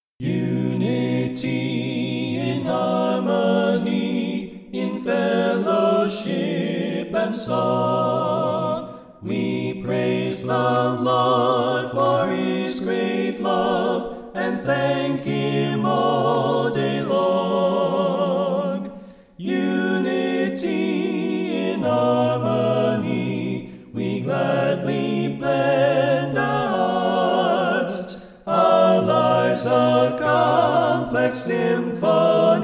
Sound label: Alto